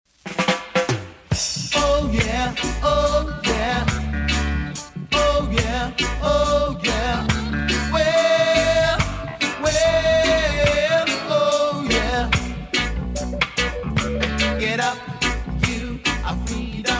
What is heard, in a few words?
Reggae; rhythm